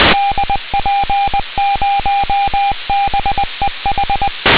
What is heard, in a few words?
fmcw, satelite; beesat4